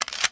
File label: shotgun reload; weapons; deluge